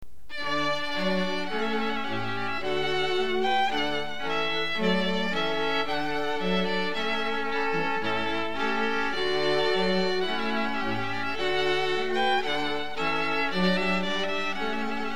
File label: Trumpet Voluntary; Clark